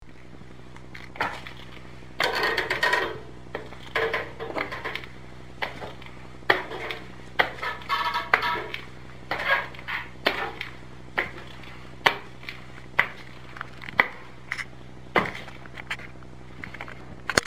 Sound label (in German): Treppe rauf